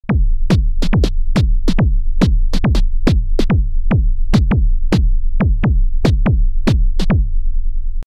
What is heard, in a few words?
Techno Kick 909 Snare